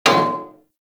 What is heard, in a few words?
Bullet Impact Debris Pipe; Warframe